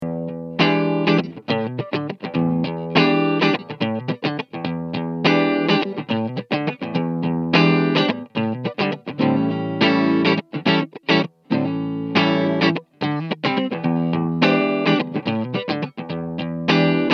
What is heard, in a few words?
Bogner Clean Captor X SM57 on axis